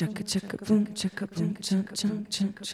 Vocal Echoes

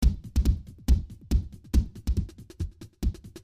Kickin' 140 intro

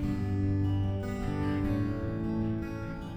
Picking D 75

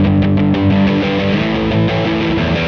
Interlude Rock E; Loops

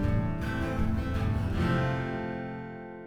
Strummed Am, F 79

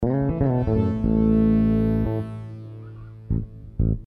120 Sitarish

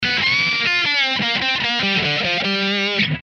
150 Lead Speed Rock G

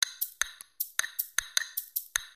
Block & Shaker

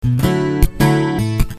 Punchy D,A 154

